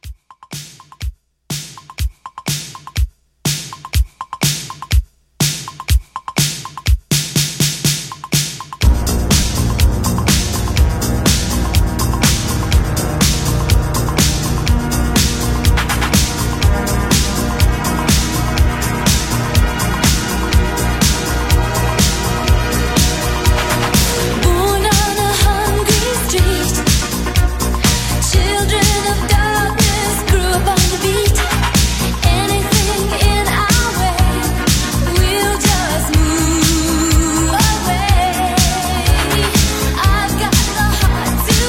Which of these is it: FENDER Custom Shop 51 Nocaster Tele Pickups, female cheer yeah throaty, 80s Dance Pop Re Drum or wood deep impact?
80s Dance Pop Re Drum